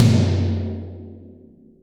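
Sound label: 14 tom; Best Service; Real Mega Drums VOL-1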